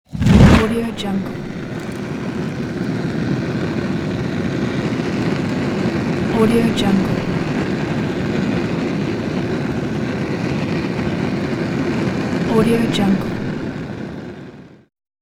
fire ignition

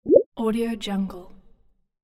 bloop

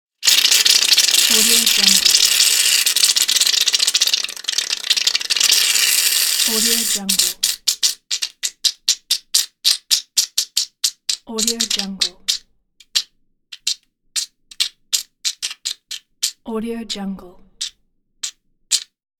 shaving foam empty; wistansound

